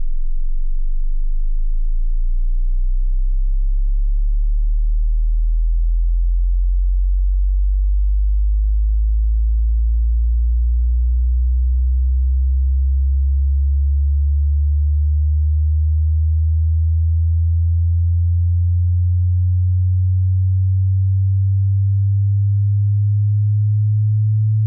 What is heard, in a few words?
20 to 200 hz, long